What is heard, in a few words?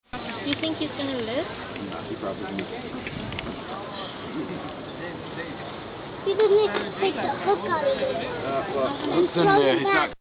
i scream